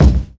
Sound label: bassy kickdrum sample B key